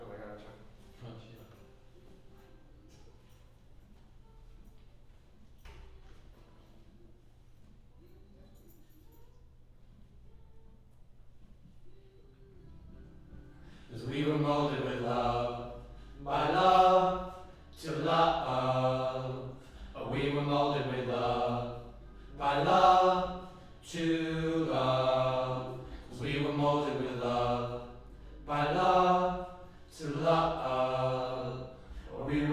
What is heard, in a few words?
Choir Harm Low